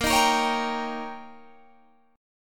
D sharp 7th A sharp, guitar

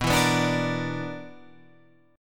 B-Minor Major 7th Double Flat 5th B down

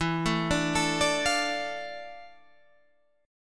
D Minor E, chord; Dm/E arpeggiated

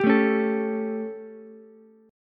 G Suspended 2nd Flat 5th G down Ukulele; Gsus2b5 strummed; JGuitar

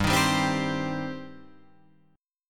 G Suspended 4th Sharp 5th G, down, Guitar, Drop D

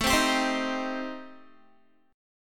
A-Minor 7th Flat 5th, Guitar, chord; Am7b5; strummed; 17,15,17,17,15,x

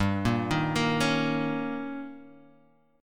D sharp 7th G, guitar

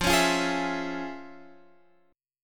F Minor Major 7th Sharp 5th, chord

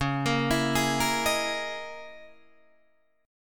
Db Minor 6th Add 9th Db down Guitar DADGAD

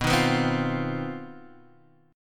C Minor Major 7th Double Flat 5th B

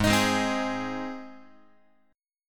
Gsharp Major, Guitar; strummed; x,4,6,6,4,7; 4A,4E,5A,5D,5G#,6C#; JGuitar

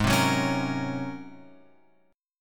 G sharp 11th G sharp; G#11 Chord {4 3 4 6 X 6} strummed

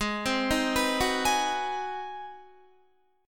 Ab 7th Sharp 9th Guitar, chord; Ab7#9 arpeggiated; JGuitar

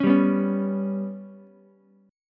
G Major Flat 5th Ukulele, chord; GMb5 strummed; JGuitar

